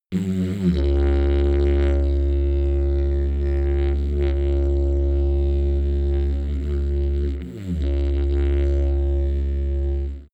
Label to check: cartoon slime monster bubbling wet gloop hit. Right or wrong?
Wrong